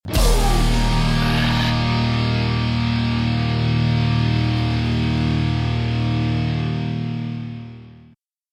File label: Boss Win; Dark Incursion; Android game music